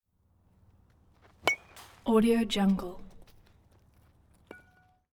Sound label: baseball